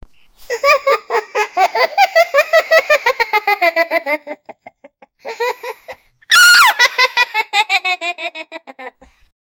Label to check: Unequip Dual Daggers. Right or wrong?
Wrong